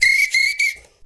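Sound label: foudang groove; Best Service; World Colours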